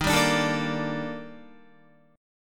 Eb Major Sharp 11th, down, Guitar; EbM#11; strummed; JGuitar

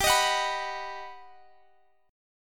G Augmented Major 7th G down Guitar Standard; strummed; capo 2

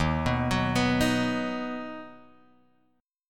Eb Minor Sharp 5th, down, Guitar, Drop D

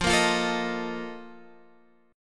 A sharp Major 7th Suspended 4th

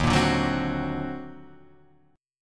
Eb Minor Major 13th, Guitar, Half Step Down

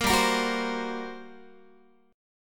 G Minor Major 7th Double Flat 5th, G, Guitar; strummed; JGuitar

